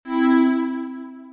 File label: smooth warning